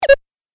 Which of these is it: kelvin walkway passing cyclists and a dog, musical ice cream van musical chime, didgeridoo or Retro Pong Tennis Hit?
Retro Pong Tennis Hit